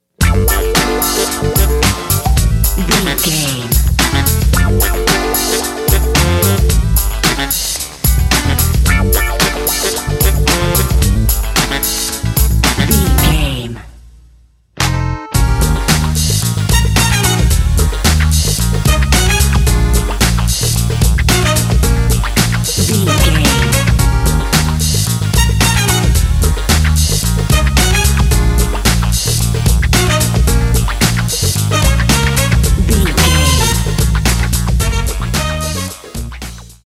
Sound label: old school sound; fat albert